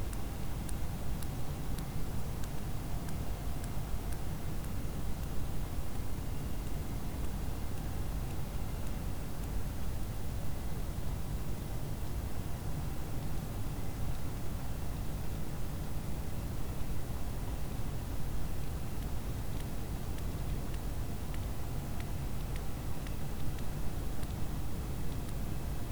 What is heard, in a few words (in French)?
cachalot positif, Physeter Macrocephalus